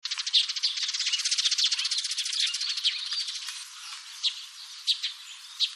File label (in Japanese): suzume